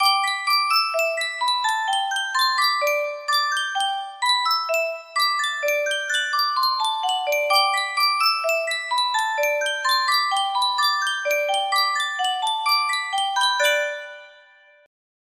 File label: sankyo music box, melody